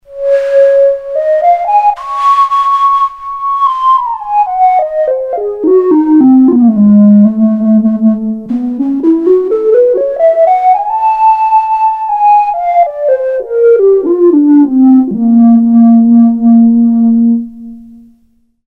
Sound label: Lonely Flute